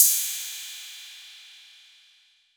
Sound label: PERC 808